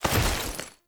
Tenno Vault Up Generic, Foley; Warframe

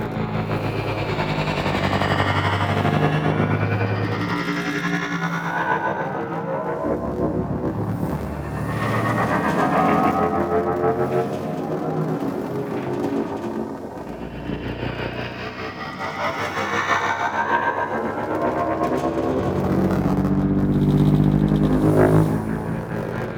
Thruster Ship Rotation Loop; Alternate Engines

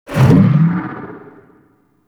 Maw Fishing Gulp; Warframe; Duviri

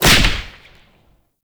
Whip Crack Small; Oraxia Whip

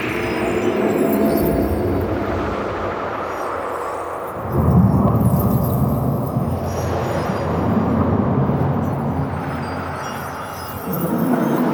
Moon Interior Amb; Warframe; Ambience; Orokin Moon

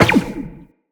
Step Zipline, Footsteps, Walk; Characters; Tenno

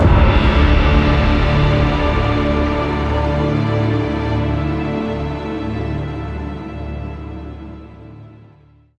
Binary Choice Loop Stop; Warframe; Cinematics; New War; Mission One

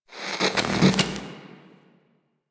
TV Turn On; Warframe; NineteenNinetyNine

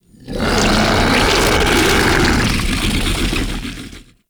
Ancient Tank Attack, Enemies, Infested, Barks; Warframe

